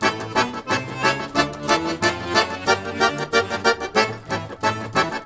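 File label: Balkanish Traditions accordion; USB Soundscan vol.63; AKAI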